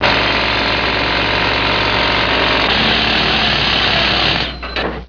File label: jackhammer